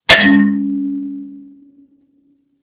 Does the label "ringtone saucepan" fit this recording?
Yes